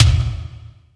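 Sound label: new age kick; WS#9 NEW AGE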